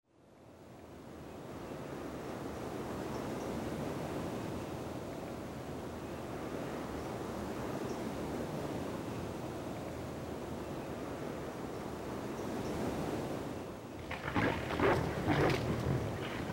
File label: Lake Monster